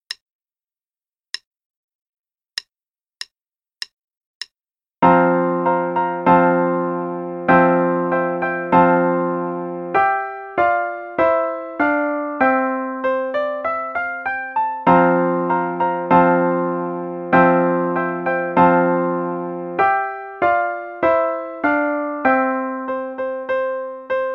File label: Roman Trumpets, no click, both parts, qn=98; Faber Adult All-in-One; Adult Book 1 (All-in-One)